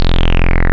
Square Res, wavetables; Animoog

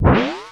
tape fx, scratch; Ambient Grooves

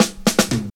Fills; Sound & Vision - Gigapack I

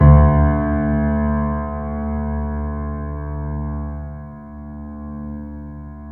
PED MF D, GRAND PED; Best Service Advanced Media Trax Modern Composer

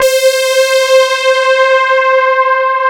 CMI PIANO; Wizoo; Powered DX

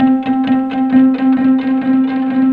KOTO C2

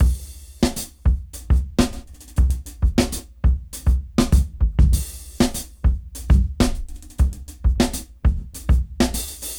Jazz Dry 99 BPM; AMG - Keith LeBlanc Phat Butt Jams

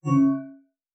conv bell; pad

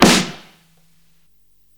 Snare, Psychedelic Drums

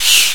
Clapping; Madden NFL '96

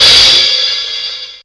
CRUSH, GRV KIT; INIS - Funky Xtreams

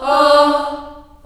D3 child AH, choir; CHILD AHS

